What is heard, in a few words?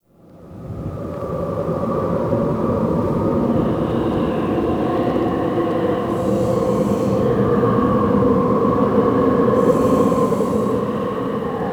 Ghost Wind; Ambience; Dagath; Warframe; Clan Dojo